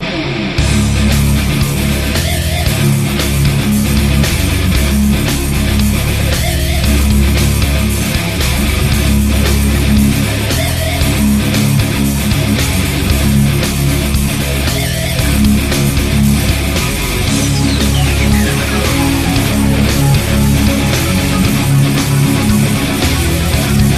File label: cathedral fight; painkiller